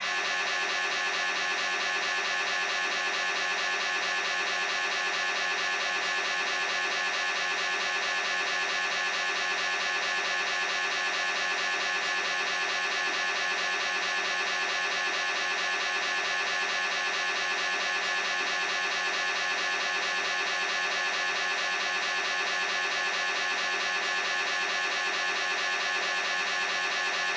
siemens 3t magnetom prisma fit whole body human scanner mri t2 tse